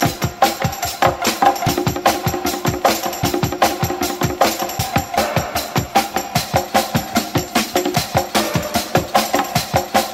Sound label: Drum loops, Funky